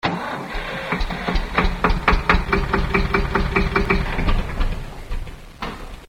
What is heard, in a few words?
Small Motor